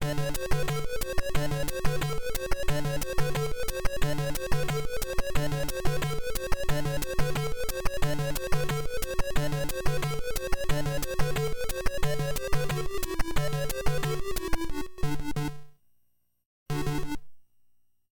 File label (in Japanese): PSG版; fray msx remastered soundtracks 2008